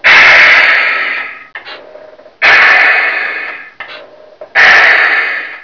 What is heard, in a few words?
airbrakes, truck